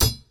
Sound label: block